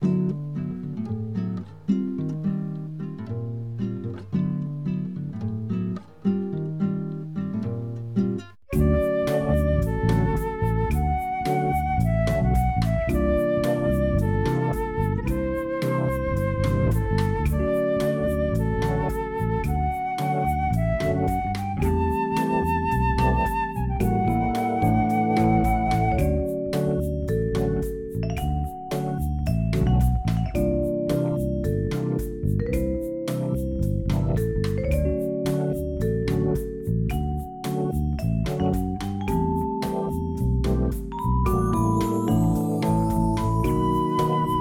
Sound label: Loop Quiet; Elevatorstuck; openbound